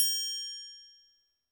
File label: HiHat & Crash, Roland TD-20; HiHats